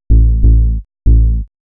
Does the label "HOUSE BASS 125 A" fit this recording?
Yes